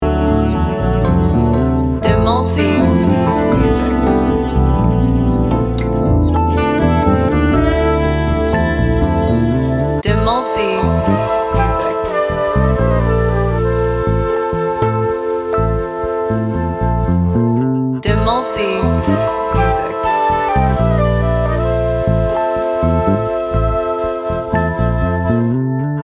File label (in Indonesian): lofi, warna jazz